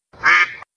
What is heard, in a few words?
Quack; cstrike; slide svn duckslides